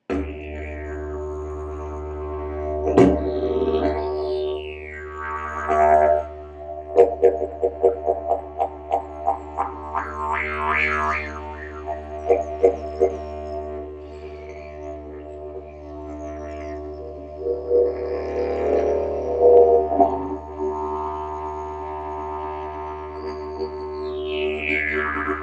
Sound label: didge